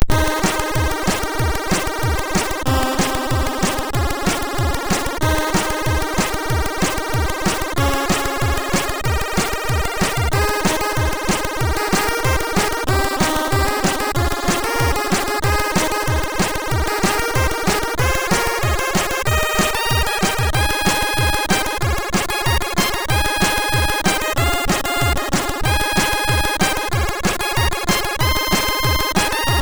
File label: MOS6581R3, sid; Melodee; hvsc